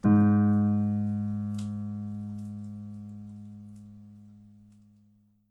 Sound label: piano sample